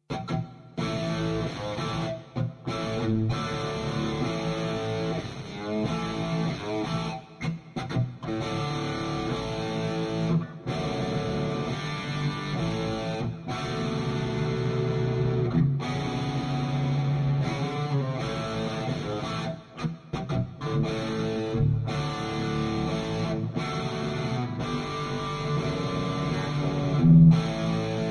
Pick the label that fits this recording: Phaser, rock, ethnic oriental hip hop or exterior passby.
rock